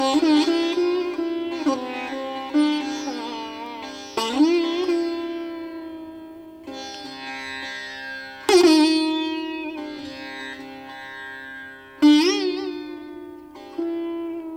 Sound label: Raag Peelo - Sitar